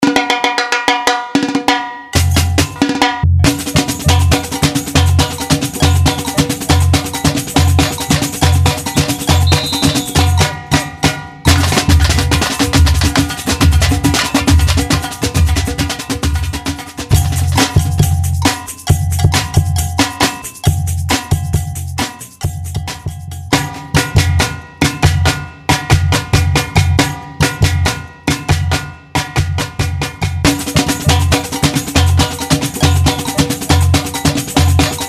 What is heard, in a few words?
samba